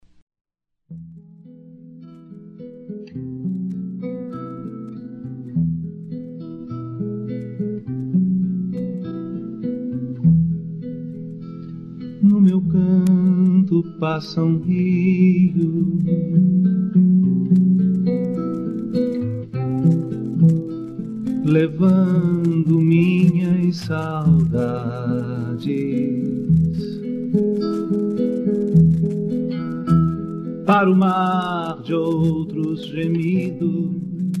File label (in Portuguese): lembranças viola